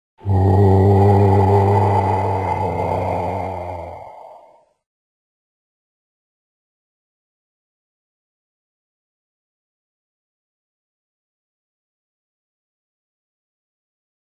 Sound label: zombie; resident evil cv